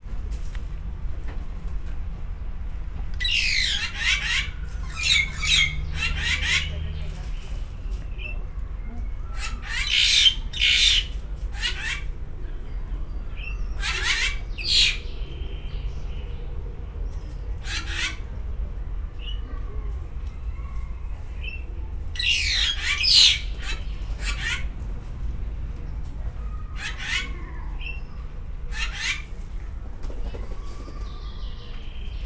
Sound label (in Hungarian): érkező látogatók, egyszínű királycsőrics; egyszinu kiraly csoricse; szegedi zoo 2011